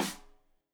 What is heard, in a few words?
Rim Shot Stereo; Deeply Sampled Drums; SMDrum